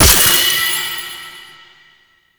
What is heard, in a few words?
Horse Racing Orb Hit; Warframe; Duviri